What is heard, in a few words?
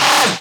Synths C; Vengeance Samples